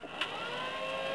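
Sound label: Motor start, droids robots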